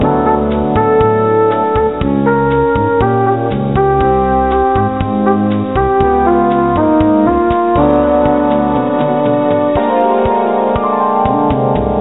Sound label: Muzak; Duke Nukem 3D; MS-DOS Version - Sound Blaster